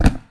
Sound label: light boots